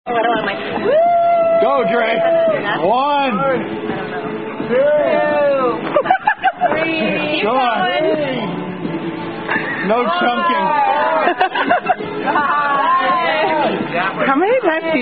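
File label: Dizzy Stick With Swinging Bat At End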